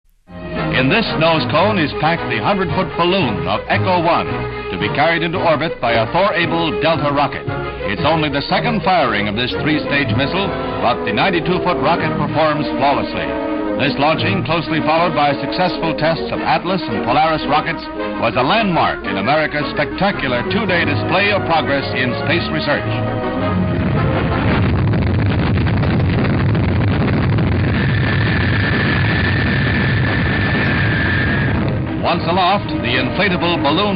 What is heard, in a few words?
echo